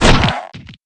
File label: tug; patricia